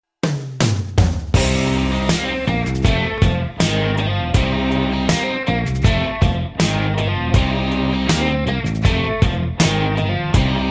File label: instrumental, Rock